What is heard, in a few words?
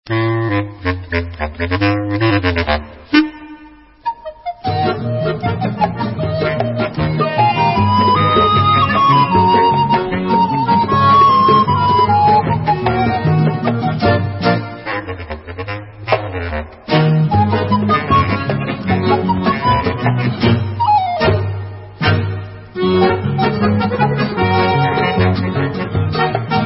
Hora Instrumental